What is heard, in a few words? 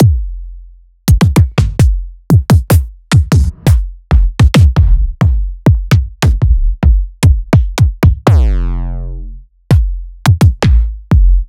Kicks; Electronisounds